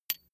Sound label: small metal impact soft high; industrie wirtschaft; metall